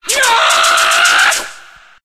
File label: noisemaker, samurai; source engine; tf